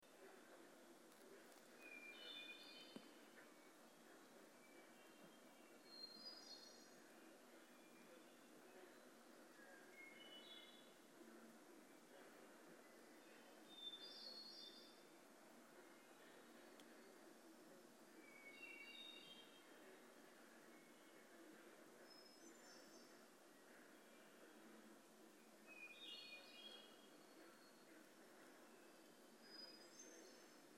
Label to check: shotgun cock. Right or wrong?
Wrong